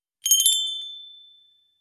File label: Store doorbell chime